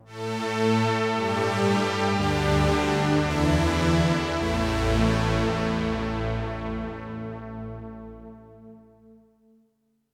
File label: pad; opxpro2; Riddle